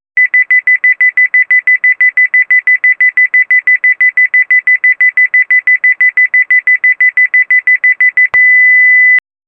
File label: friendly mobile phone mor, phone